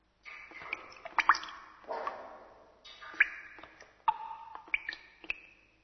cave drip; ambience

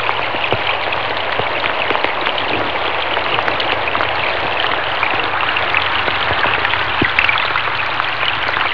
stream, ambience

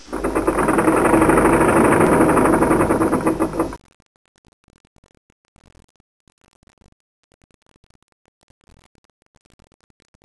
galleon ship

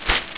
player leaves

